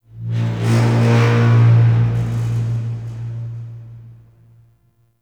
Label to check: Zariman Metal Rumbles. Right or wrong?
Right